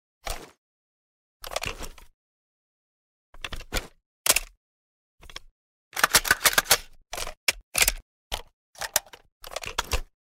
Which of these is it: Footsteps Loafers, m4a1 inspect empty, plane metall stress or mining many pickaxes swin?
m4a1 inspect empty